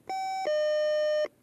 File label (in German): DVT30 aussenstation